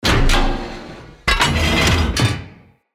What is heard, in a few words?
Siege Engine Cannon Warning; Weapons; Enemy; Artillery; Ode Siege Engine